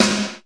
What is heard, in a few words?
rimshot; Aggressive Electronic Kit